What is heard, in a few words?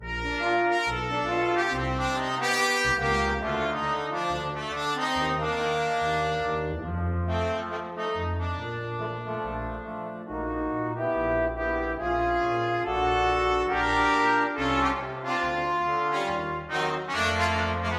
jump blues joy to the world BRASS, brass quintet